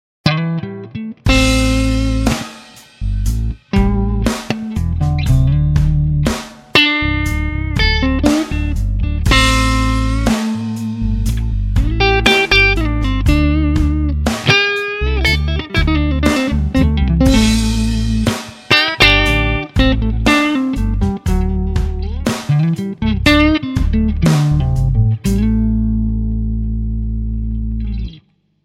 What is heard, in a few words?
Clean